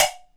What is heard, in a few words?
stock, Bells; Misc Percussion